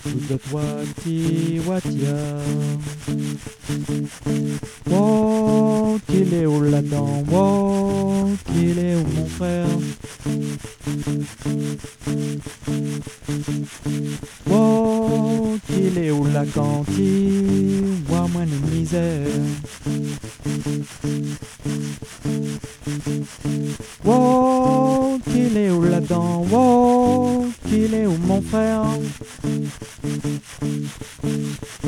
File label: Refrain Soprano